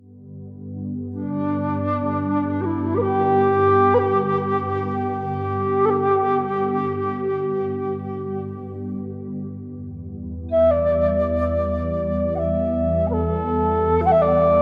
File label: Cat Music, Healing Music